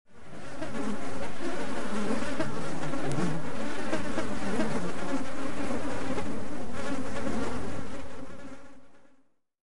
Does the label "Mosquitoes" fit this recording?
Yes